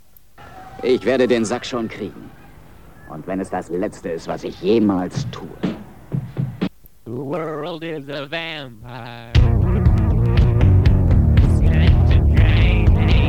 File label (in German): Testaufnahme Tapedeck Kassette eiert leiert